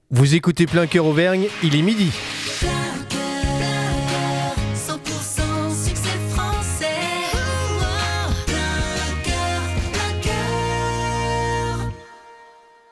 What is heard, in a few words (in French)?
TOP HORAIRE NEUTRE MIDI; MOULINS; TOPS NEUTRES; PLEIN COEUR